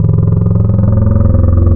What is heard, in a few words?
choir; Synthetic's Serum Collection; Vowel; DudaChoir